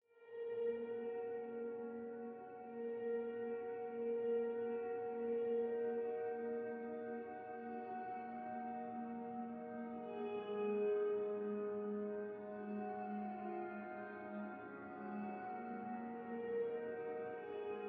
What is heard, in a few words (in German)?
Blackhole VST Klavier; Nebula